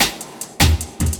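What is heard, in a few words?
loop snare drum, 150 BPM, drum & bass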